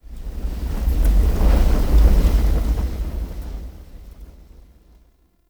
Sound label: Invis Rune Active One Off; Powers; Runes